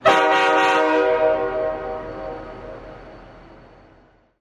Train Horn Loud Echoe

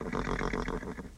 Horse General Snort

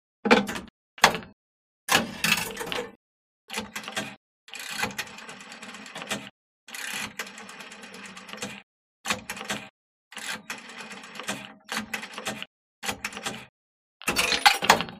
Phone Dialing Rotary, foreign

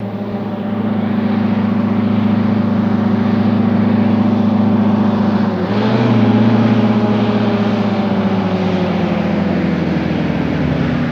Truck Diesel Stp Idle

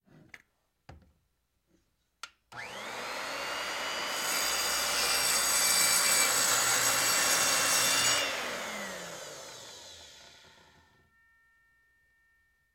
Elect Circular Saw On

